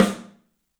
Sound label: STEEL, Drums, ROOM SINGLE; 3D Sound